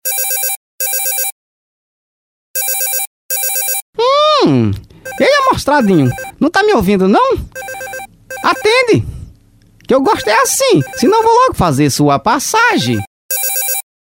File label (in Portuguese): toque telefone; amostradinho